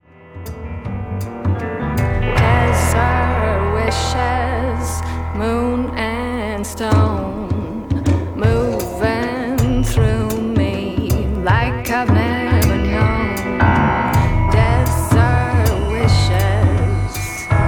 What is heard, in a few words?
Desert Wishes Ringtone